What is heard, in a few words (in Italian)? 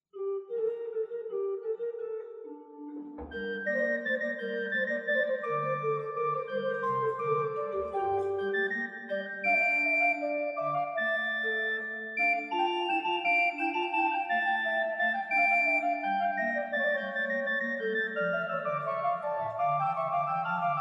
Ottavino bassi